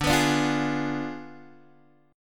D Diminished 7th D, Guitar, Open G

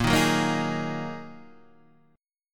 A sharp 7th Suspended 4th Sharp 5th down

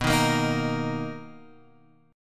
B Major 7th B down Guitar Open F; strummed; JGuitar

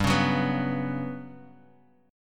G Major 7th Flat 5th, Guitar Half Step Down

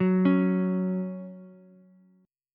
F sharp 5th, Ukulele; arpeggiated; JGuitar

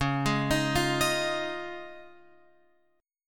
Db Major Add 9th, Guitar Open F; arpeggiated; x,8,8,10,8,10

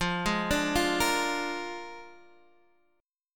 A sharp 7th F, Guitar

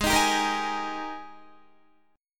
Ab Major 7th Suspended 2nd Ab down Guitar; strummed; JGuitar

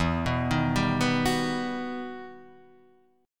E Minor Major 9th E; EmM9 Chord {0 2 1 0 0 2} arpeggiated

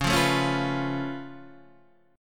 Db Augmented 7th Db down Guitar Standard; strummed; JGuitar

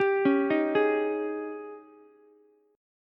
Ab Major 7th Suspended 4th Ab down Ukulele; AbM7sus4; arpeggiated

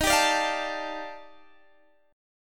Eb Major Sharp 11th, down, Guitar, DADGAD

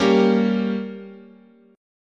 G Diminished, guitar chord

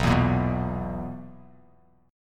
B Major 7th Suspended 4th B down Bass Standard; BM7sus4; strummed

